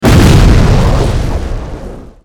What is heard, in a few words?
weapon; gwyn; zimen